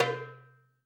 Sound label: DARBUKA, AFRICAN DARBUKA